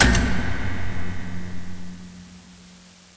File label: light power on; XDM; buttons